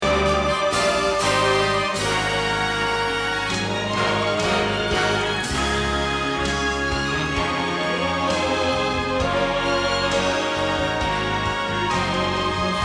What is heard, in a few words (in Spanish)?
Bajo